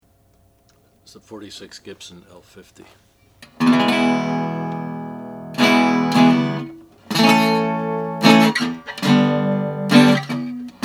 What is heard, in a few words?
gibson l 50 sunburst